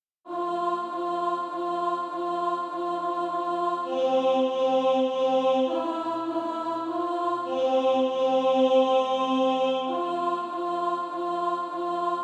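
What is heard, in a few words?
alto, SATB Practise Music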